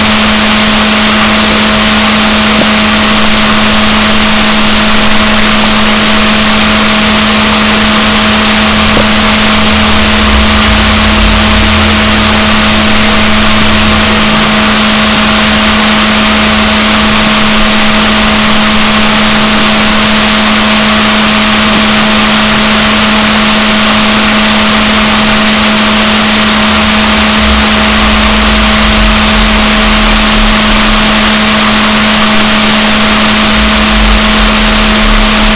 rorquals positif, gaussian noise, BalaenopteraPhysalus Chile